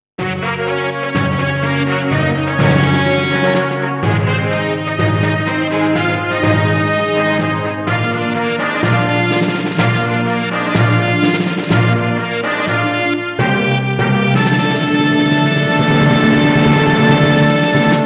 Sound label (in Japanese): ホームラン・ファンファーレ (EVENT BGM); Alice Gear Aegis Original Soundtrack Vol.3